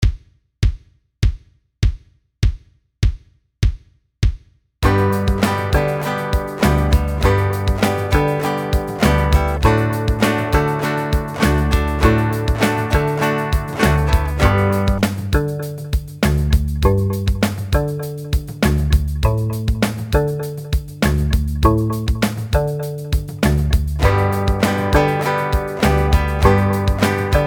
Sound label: Band